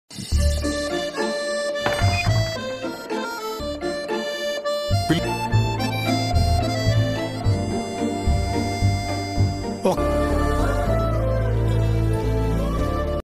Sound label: naa saami ranga movie love bgm and ringtone; nagarajuna; ashika ranganath